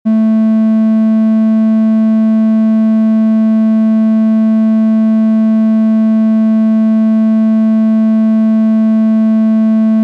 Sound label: A3 220 00; Brainicity